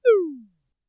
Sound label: down